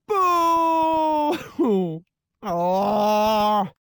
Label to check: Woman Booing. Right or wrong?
Right